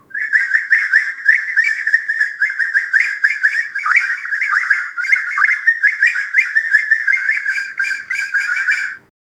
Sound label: a tyrannosaurus, dinosaur